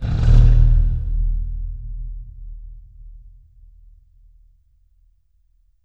Pipe Rub; Effects

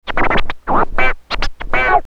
TURNTABLES, Hip Hop; InVision Interactive; 40 Oz Phat Slammin

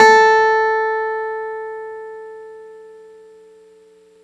CLAVINET A4; Big Fish Audio - First Call Vintage Keyboards

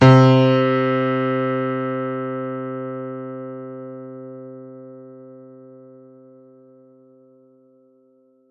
C3, Piano; Giga Samples Collection; SCC1 Piano Lite

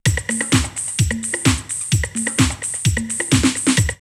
Rimz 129, drumloops, Techno&House; X-Static Goldmine

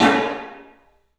CLUB SN VRB, 3D Drums; 3D Sound

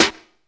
street, snares; Zero-G - Funky Elements

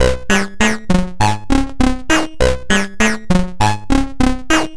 Modular Loop, 100BPM; musicradar; bitcrushed; Crushed Loops

